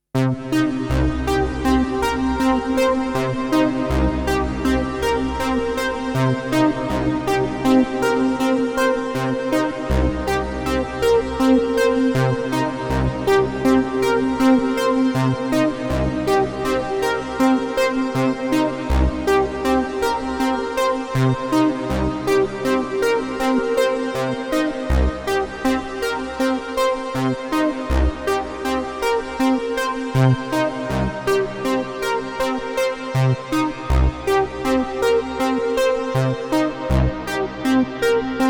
Plate Filter Settings

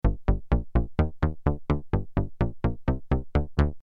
poly d bass

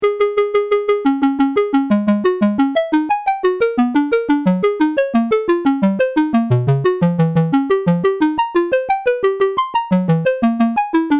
FX Randomizer

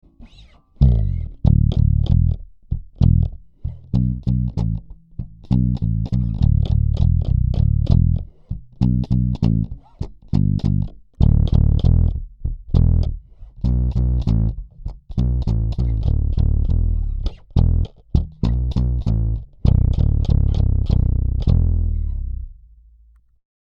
Glowplug Active Bass Bypass Deep dist

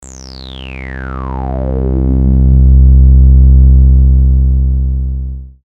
Reso 2 LP

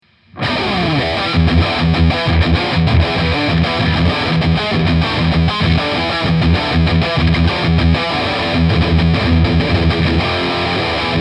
Boss Katana Artist MKII Brown plus Boost

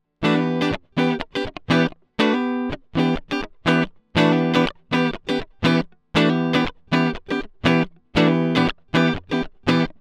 Strat passive pure Z Tone Sweep; IK Multimedia Axe I/O Solo

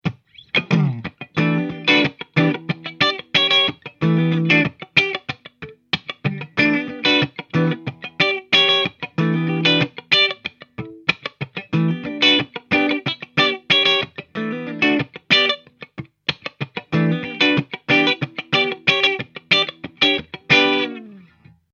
Clean

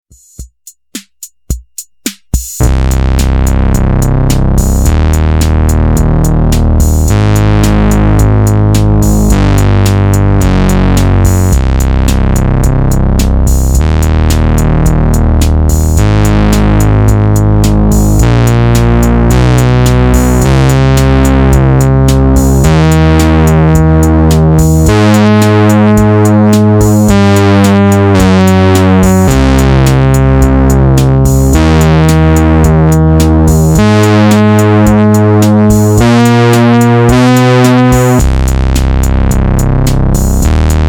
taurus

